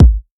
warm kick drum one shot A key, Royality free kick sound tuned to the A note